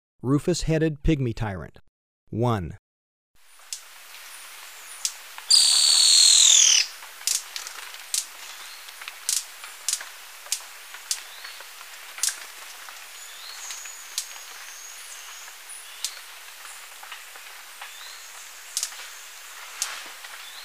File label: Rufous-Headed Pygmy-Tyrant; S. America